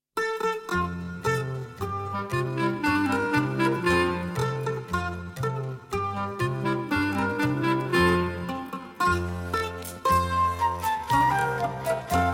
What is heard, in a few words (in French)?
ah mon beau chateau version instrumentale ralentie